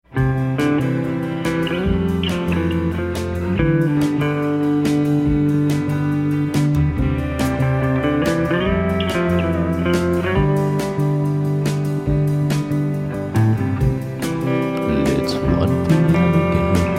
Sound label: Slow Guitar